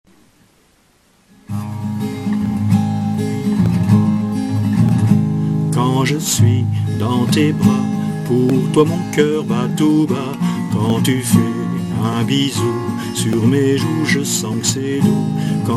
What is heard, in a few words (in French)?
Chanson pour mon papa